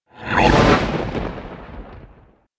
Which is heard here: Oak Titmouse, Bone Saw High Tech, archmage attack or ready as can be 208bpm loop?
archmage attack